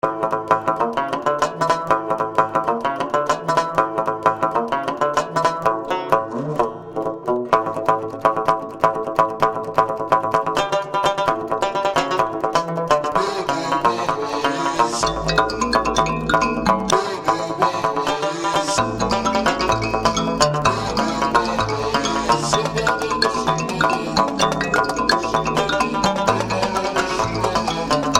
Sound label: World Music africa; JamPackWorldMusic